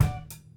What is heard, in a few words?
HIT